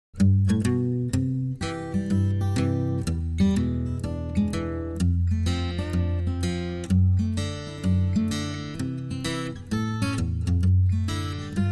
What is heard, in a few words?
Acoustic Guitar Only